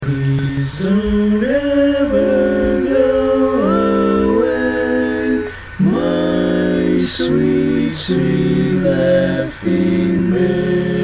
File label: All Parts, barbershop